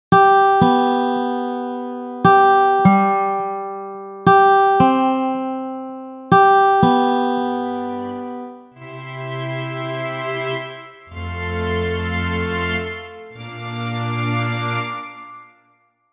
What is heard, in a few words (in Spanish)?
musica